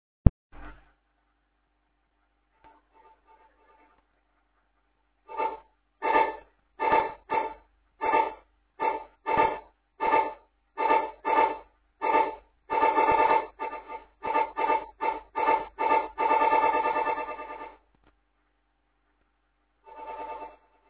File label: Shortwave radio, Shortwave radio + projector remote control